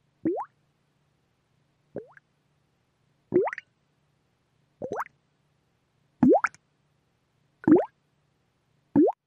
Bubbles Single Bubble

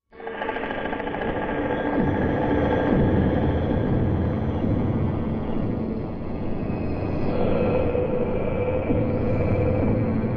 Underground Pressure, probes; Underground Deep Echoes Distant Construction

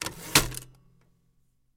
Typewriter Carriag; typewriters

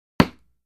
Dart Hits Target, darts

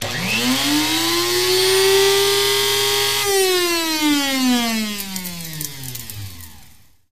Coffee Grinder: Electric Machine Spin.

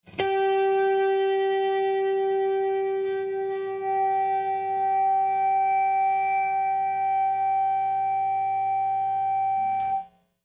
Elect Guitar Feedbac, guitars

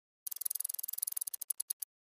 Clock Gear Mechani, ticks

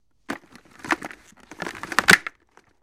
Football Helmet Pla, football

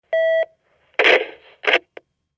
Intercom Beep Line Ha, futz intercoms

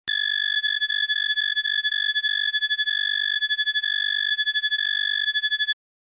Scanner Pulsing Very, drones

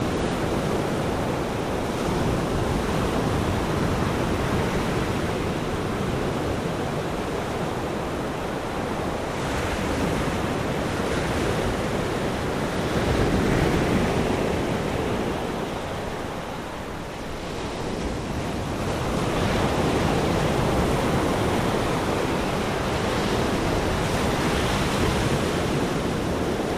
OCEAN SURF, WATER - NATURE WAVES & SURF

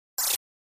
Flash Gear HF Elect Ri, flash gear

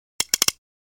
Chamber Spins A Few Ti, chamber spin

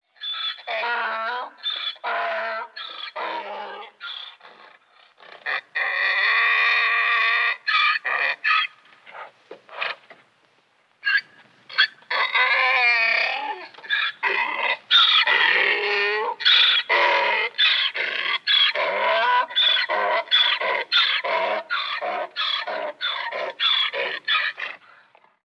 Donkey Brays Medium

